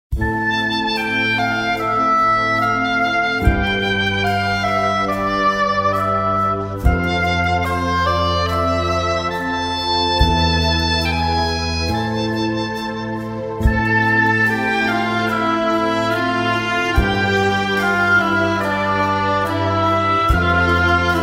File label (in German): Nach dieser Erde Karaoke